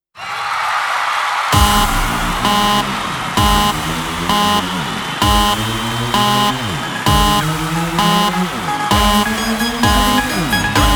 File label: EDM Song, Tamil